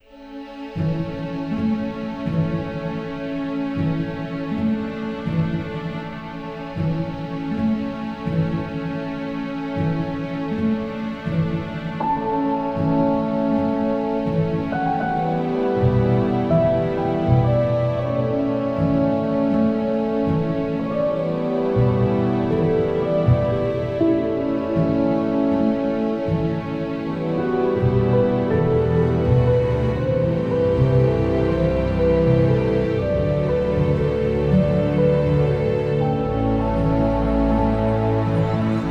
80bpm gentle epic

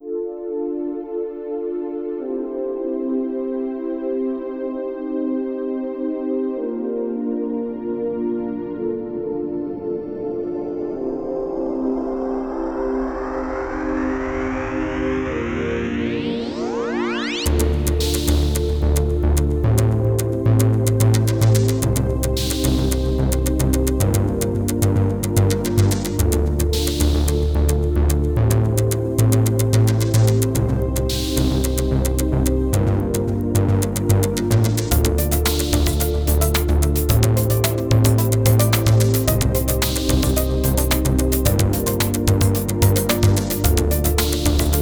110bpm pensive electro

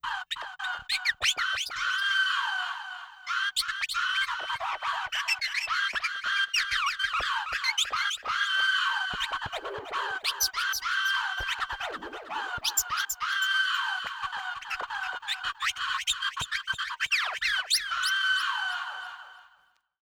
100bpm light staccato cello DECKS

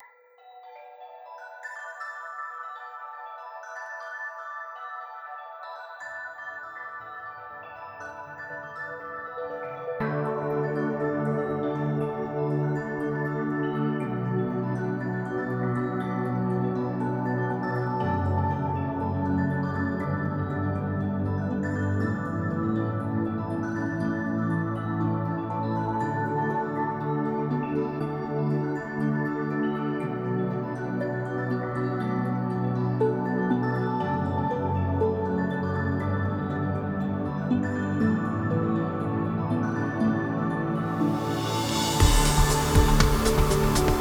120bpm mellow sparkly life affirming

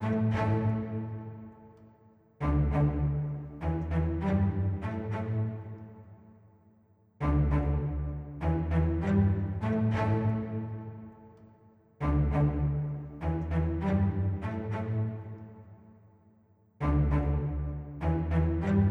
100bpm light staccato cello stems cellos

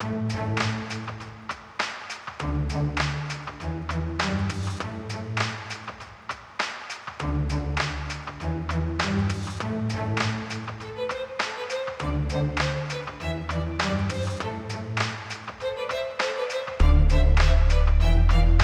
100bpm light staccato cello

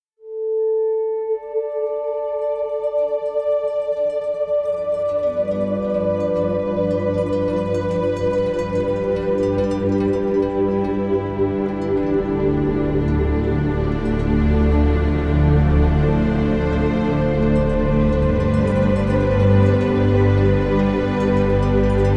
71bpm beautiful ambient resolve

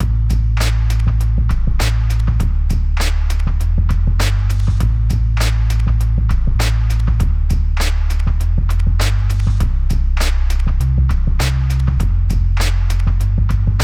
100bpm light staccato cello underscore

